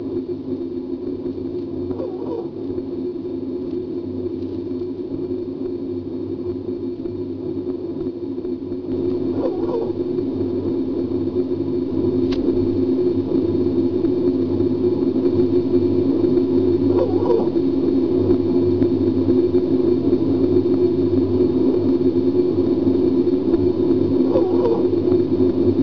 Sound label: PE Hard 161ft 5in dia, Water Leak Detection